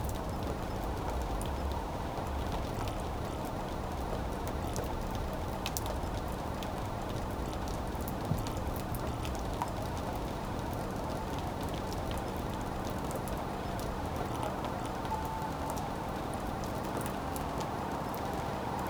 night rain falling on wet, wet asphalt

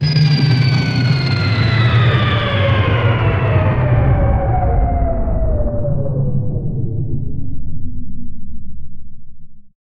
a giant alien ship; taking

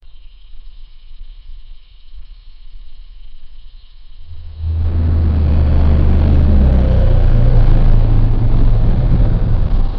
automobile exhaust system

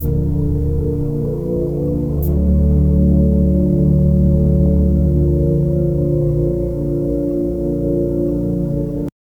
muffled organ notes, gothic

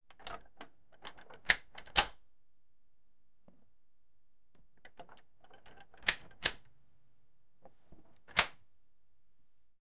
airlock open and airlock, scifi

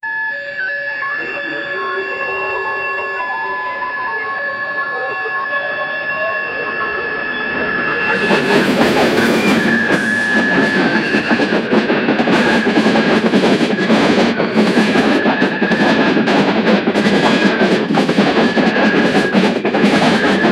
rdio steam train, train; 2017

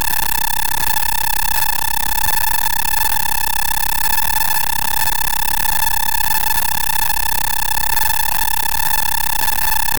realistic audi, bus

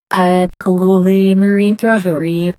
ui increase; interactive

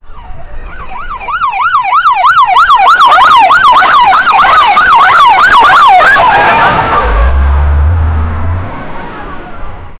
police

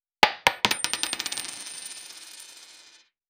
coin drop

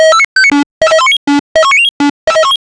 electronic make it say me, gaming

